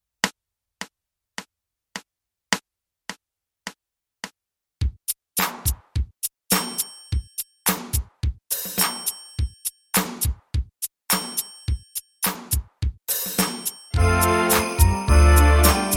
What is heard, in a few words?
Deck the hall tempo100 no piano